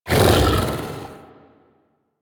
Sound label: Melee Grunt; Enemies; Vocals; Warframe; The New War; Archon; Amar